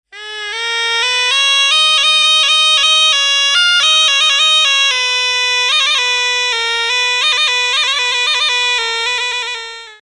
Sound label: aerophone